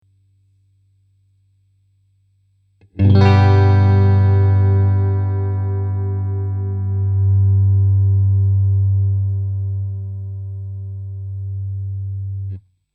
Guitar LOW; grace design felix

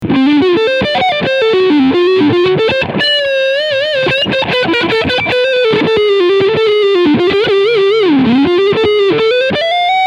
Front PU Overdrive

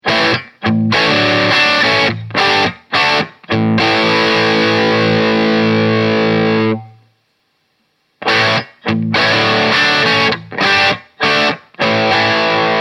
Crunch Tube Stages

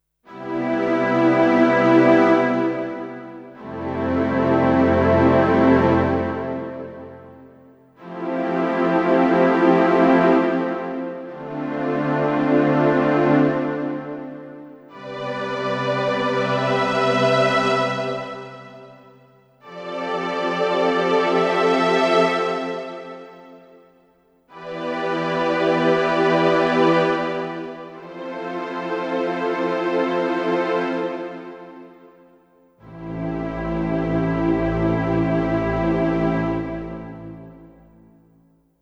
Kawai ES120 Strings

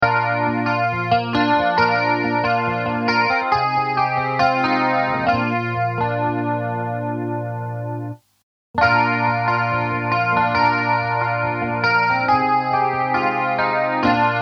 Lexicon Guitar Room

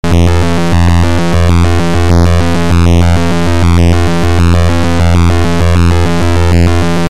phase shift

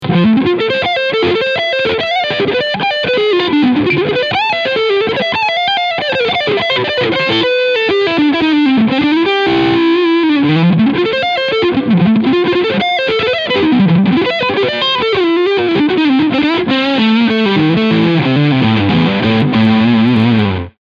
Ibanez SA360NQM SPB Leadsound Front Singlecoil

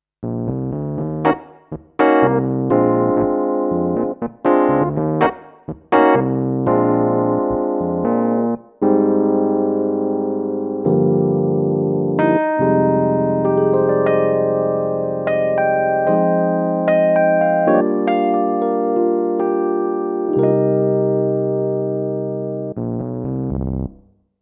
Yamaha P-125 Rhodes

